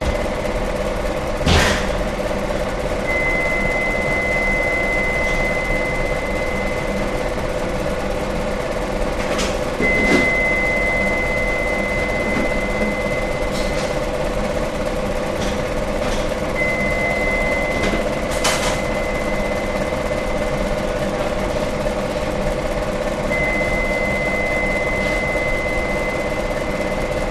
German Bakery Stirring Machine Running Bakers Working In Background Beeping; your free sounds